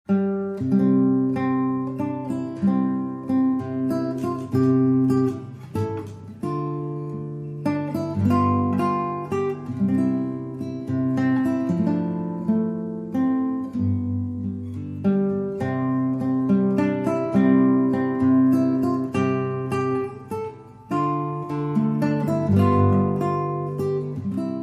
Solo Guitar

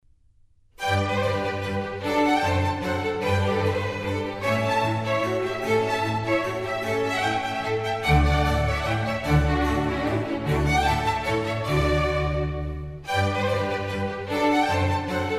Concerto for Strings in G major; RV145; Antonio Vivaldi

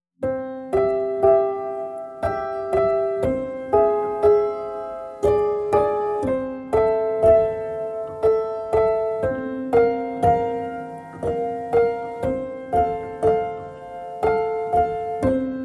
Solo Piano Mix

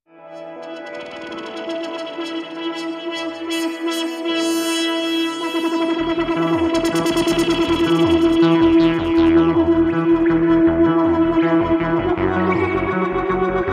Electro Ambient

